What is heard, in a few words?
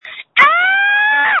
Scream